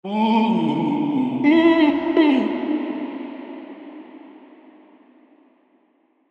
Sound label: Vocals Tin Wash